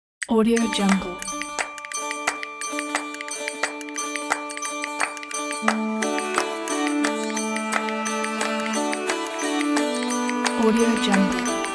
jingle bells middle east version; qarqarmusic

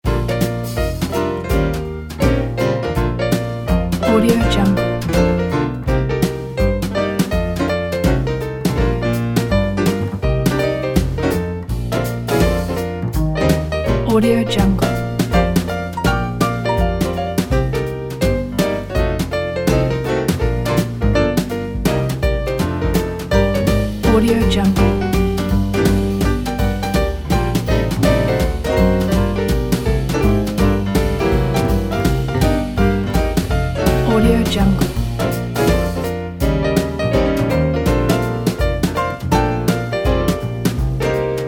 piano jazz

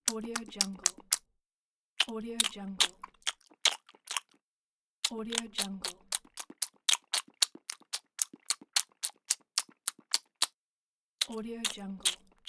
cartoon chomp

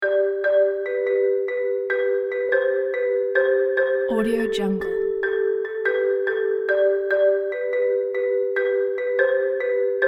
corporate adventure

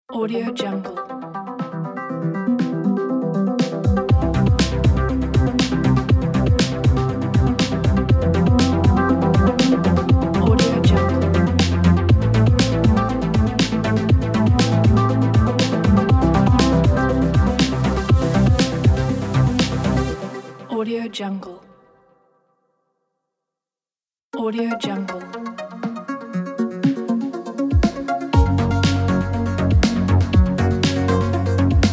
bright electronic logo pack

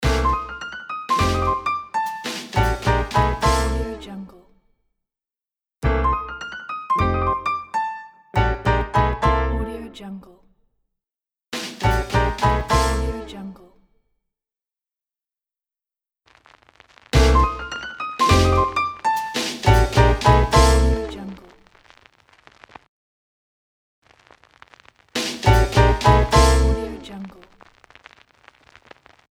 swinging jazzy logo

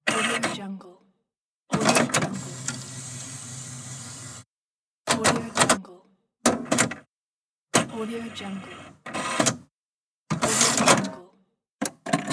printer; by ducentije

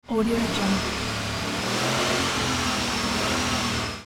chevrolet revving; sound ideas

